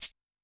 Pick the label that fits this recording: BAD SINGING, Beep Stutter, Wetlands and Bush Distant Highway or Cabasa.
Cabasa